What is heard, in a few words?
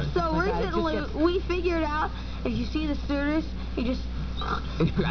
snore